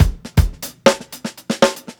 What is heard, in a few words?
120 BROKEN BEAT, DRUMS LOOPS; BASEMENT DRUMS VOL 2